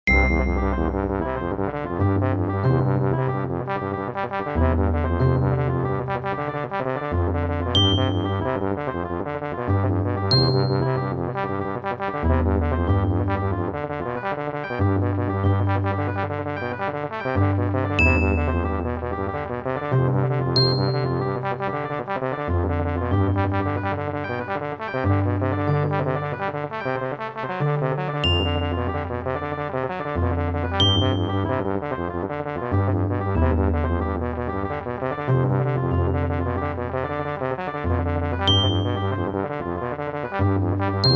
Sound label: happy sad, fractal music; Sloth canons